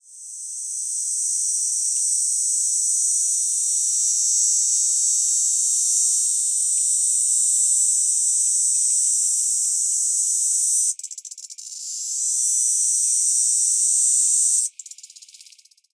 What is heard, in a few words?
Diceroprocta marevagans, cicadas, filtered; US; TX; East of the 100th Meridian